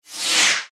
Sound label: Space Door Open, Scifi, Space, Ghost, Moan, Bend, Eerie, Science, Fiction; Spacecraft; BlastWave